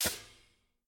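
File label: Door Pneumatic Up Gas Release; Puddle; puddle plus ios gamerip 2018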